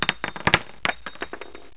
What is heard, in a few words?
pl shell, weapons